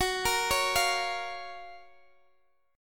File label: Gb Major 7th, Guitar Standard; arpeggiated; capo 9; chordshape